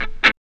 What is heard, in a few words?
tschak; X-Static Goldmine 1